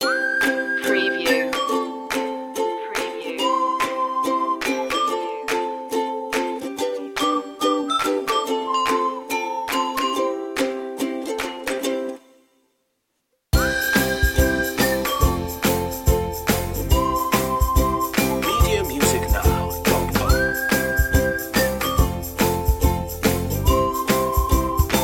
jolly ukulele; positive music

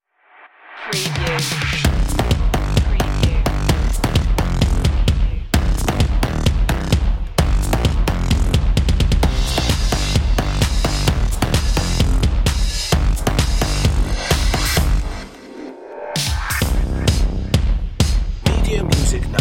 drums and bass, Hip Hop; urban-rnb; underscore

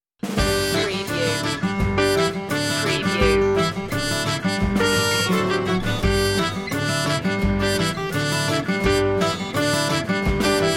knock knock rag, novelty

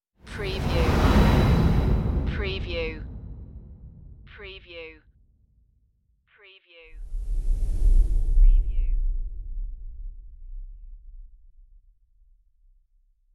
space whooshes; ghost; the sound pack tree

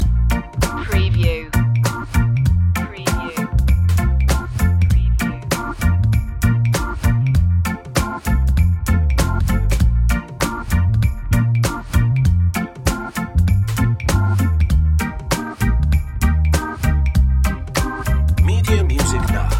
reggae party loop; roots

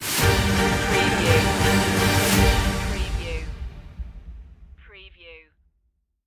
battle music

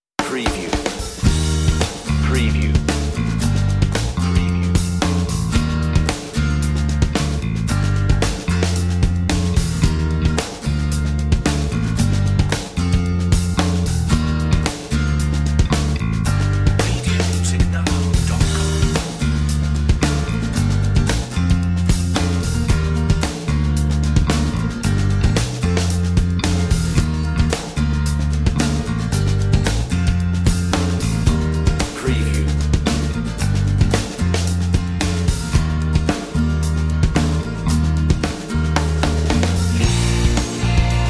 City Escape no piano melody, on-hold music

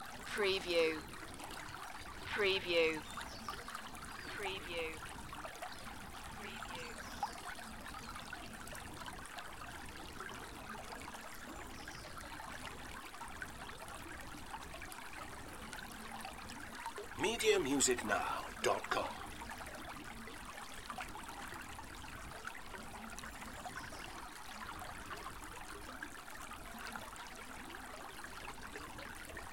Quaint Stream Loop, trickling; lynne-publishing

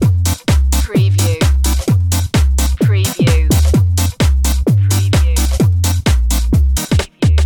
electro swing loop, electronic drums

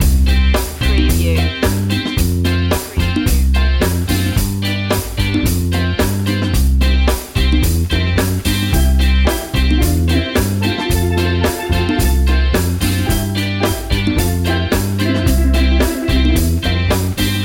beach fun loop, caribbean; world influences

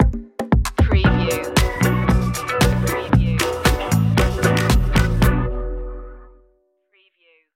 stinger; bitter

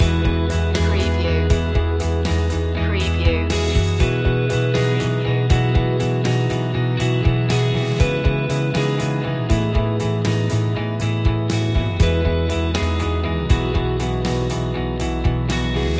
loop, Pop-Rock

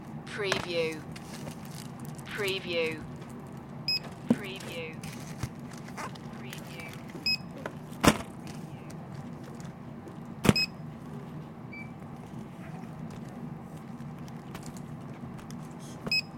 Library Check Out

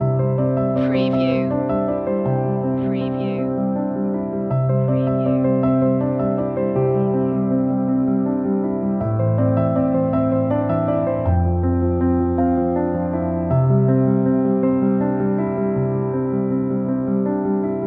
butterflies loop, Wedding

